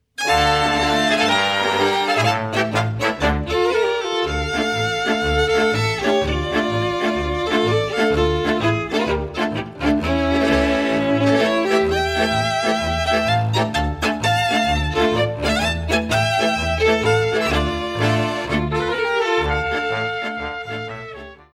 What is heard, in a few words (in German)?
Zigeunermarsch